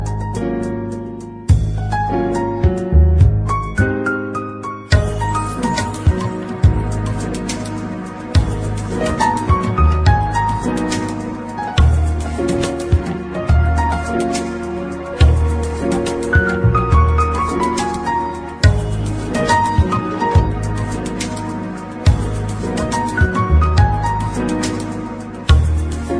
instrumental jazz

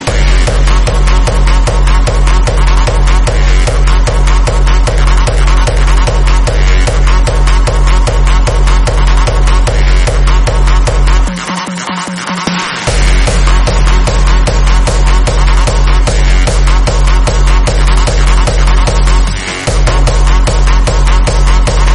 hardstyle; iPhone

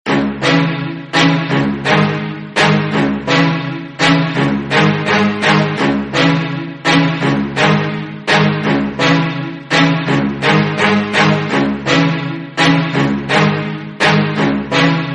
reggaeton; Funny

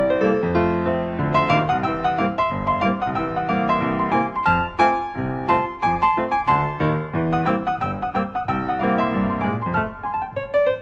piano roll; Electronica

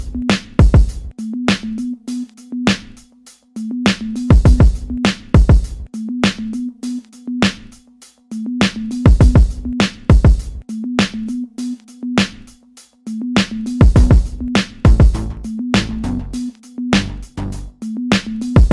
instrumental

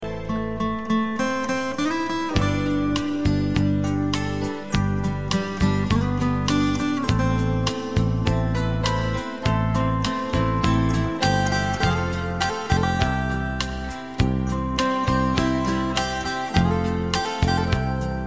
peaceful mind

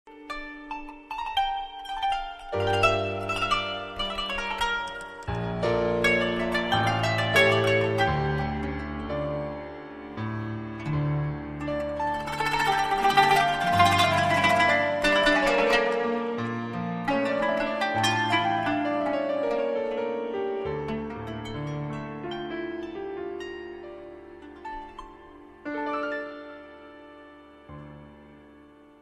istanbul music

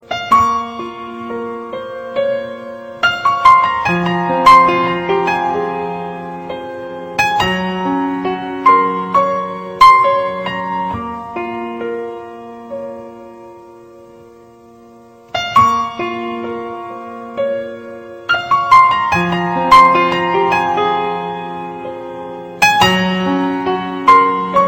sad piano

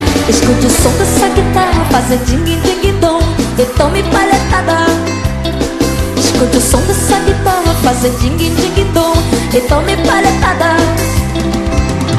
calypso; Latin

